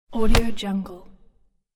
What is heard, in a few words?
fat fall down hit